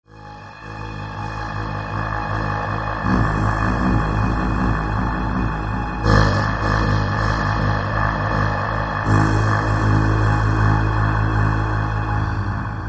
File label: Ancient Disturbing Tribal; LYN115 Trailer Production Elements